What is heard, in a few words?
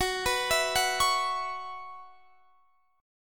Gb 7th Suspended 4th Gb down Guitar Standard, chord; Gb7sus4; arpeggiated; JGuitar